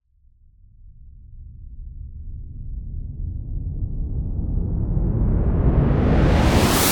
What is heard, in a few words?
cinematic reverse